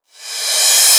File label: Reverse Cymbal